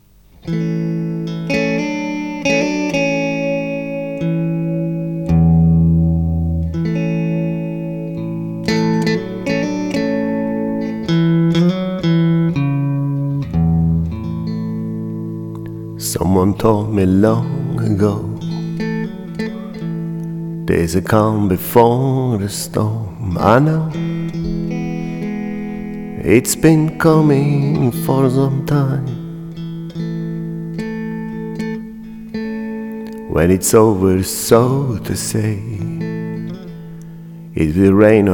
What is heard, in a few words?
Guitar